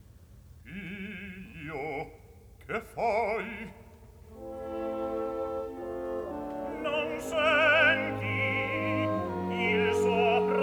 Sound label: Crowd; Figlio, che fai; Timur, Calaf, Liù; Puccini; Turandot